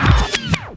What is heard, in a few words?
Scratch Tape; FX; Ambient Grooves